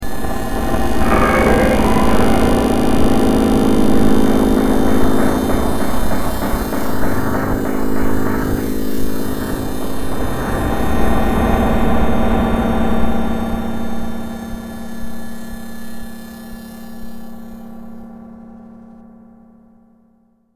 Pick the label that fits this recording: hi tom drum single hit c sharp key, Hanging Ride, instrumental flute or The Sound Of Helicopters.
The Sound Of Helicopters